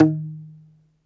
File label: DOMRA, Segundo Domra, Plucked Strings